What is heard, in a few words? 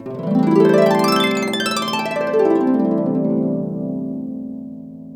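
gliss up down, harp; InVision Interactive; Lightware VOL-1; Instruments & Percussions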